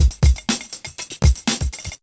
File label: Drum Funky